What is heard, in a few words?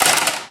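physics clipboard impact small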